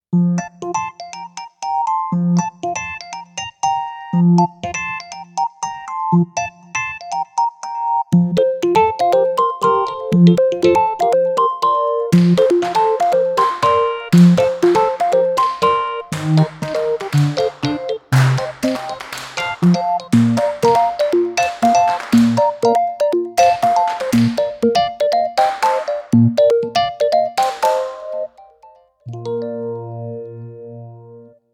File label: Childish Play, KIDS 귀여운